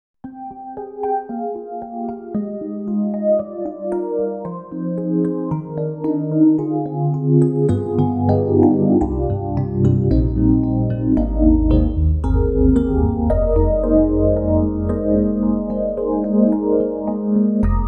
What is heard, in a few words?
EDIROL SD-90, Enhanced, Stage 73, Rhodes